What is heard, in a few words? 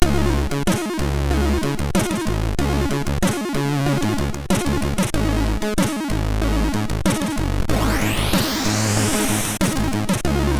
MOS6581R2, sid